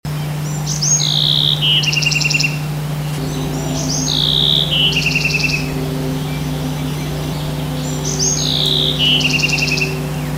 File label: Bewicks Wren